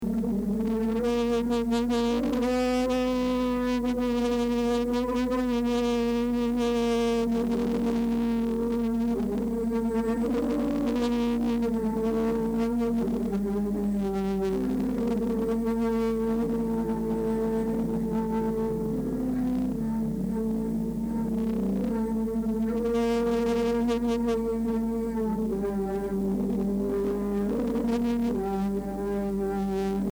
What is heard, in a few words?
river sound recordings; one river; klaus osterwald